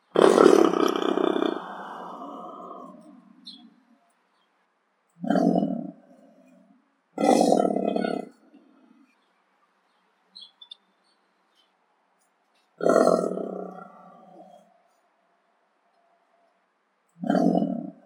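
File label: European bison, Bison bonasus, female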